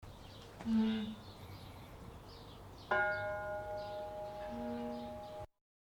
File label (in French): cloche; Avrillé